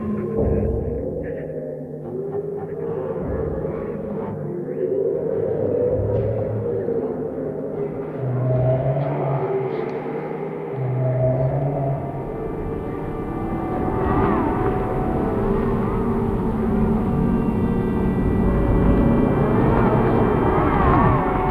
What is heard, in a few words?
screaming cave; Magic GameClient